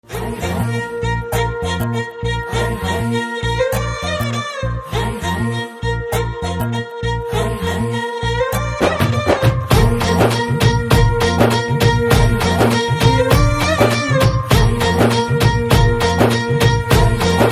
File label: BGM Telugu Ringtone